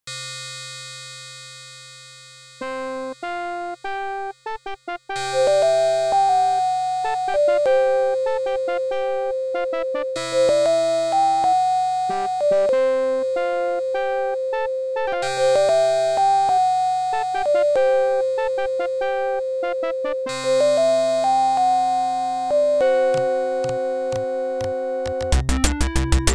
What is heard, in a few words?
AdLib